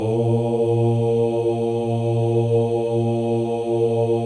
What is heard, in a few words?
MALE OHS; Spectrasonics; Symphony of Voices